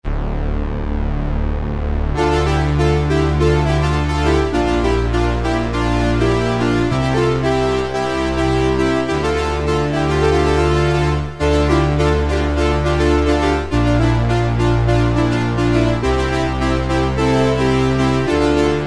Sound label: Strings; Cheetah MS6